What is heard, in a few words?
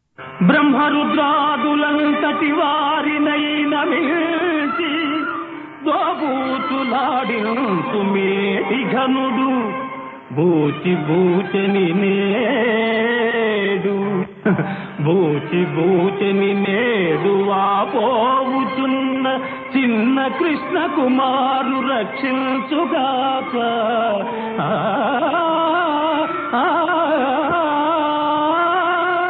Padyam; KrishnaLellalu